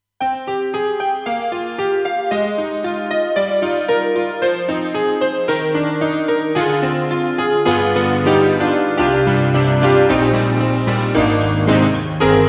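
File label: Honky Tonk; KAWAI; GMega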